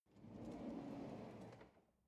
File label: drawer cutlery draw out slow